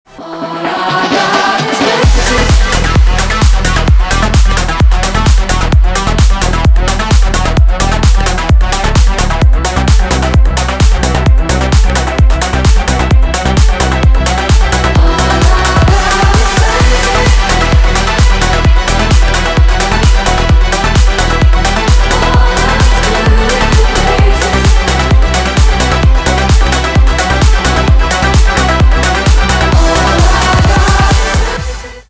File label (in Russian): melodichnaja edm narezka